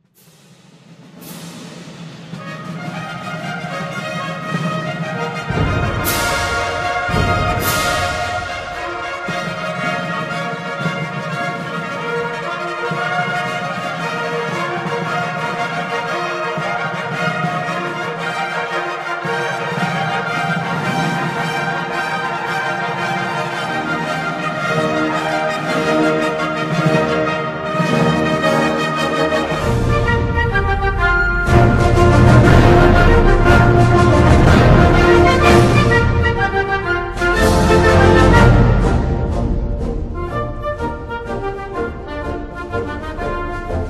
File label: Complete National Anthem; Andany